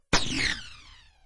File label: laser sniper shot